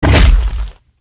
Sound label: Bite